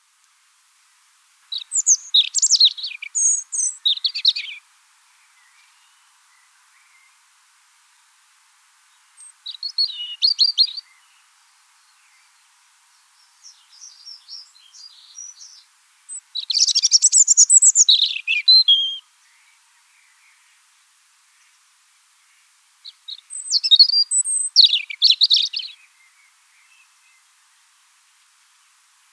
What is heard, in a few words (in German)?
Rotkehlchen Gesang; Wiesloch